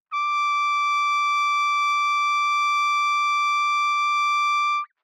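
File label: Trumpet vibrato ff D6 stereo, Bb Trumpet; MIS Pitches - 2014; Brass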